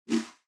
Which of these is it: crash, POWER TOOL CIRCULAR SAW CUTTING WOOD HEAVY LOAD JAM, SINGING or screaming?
crash